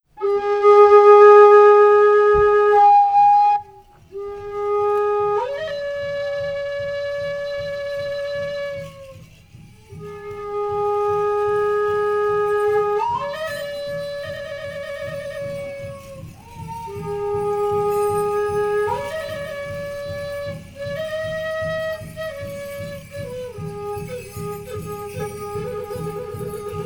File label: Flute And Dance Song